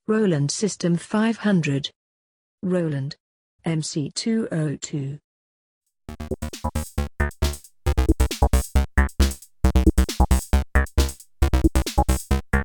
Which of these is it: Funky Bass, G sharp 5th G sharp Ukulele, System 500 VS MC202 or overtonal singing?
System 500 VS MC202